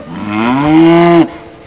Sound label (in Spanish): VACA, moo